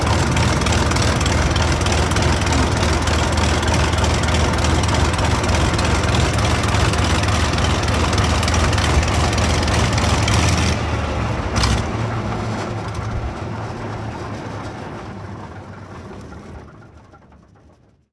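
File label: Plane P40 Interior Taxi Shut Off; Airplanes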